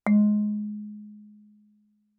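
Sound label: Marimba cord fortissimo Ab2; Percussion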